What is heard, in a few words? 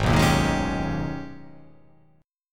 G sharp Minor Major 11th